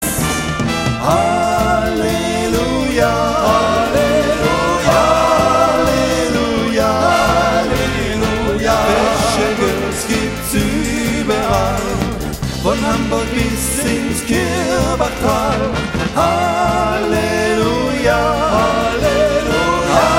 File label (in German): Halleluja